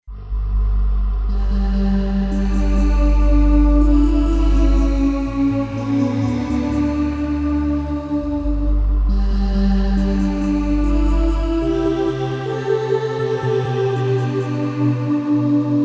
ChuChu Vox, Roland JV-880; Factory Patches

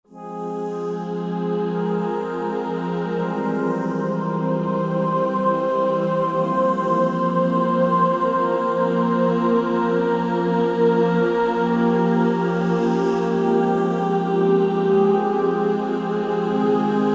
Vox, Roland JV-2080

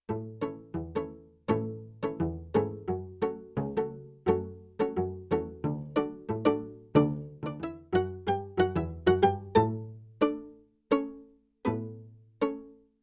Pizz Oct Stereo; Yamaha TX16W

